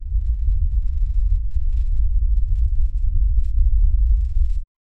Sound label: mech foot step in windy